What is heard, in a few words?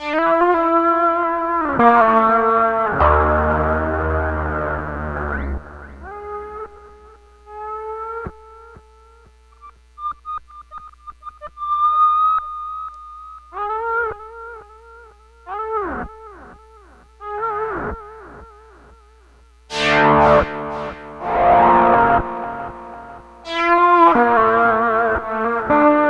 Whale Guitar